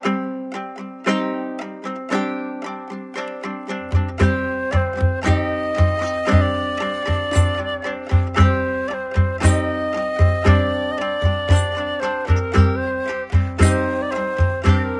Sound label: bard kitara drum flute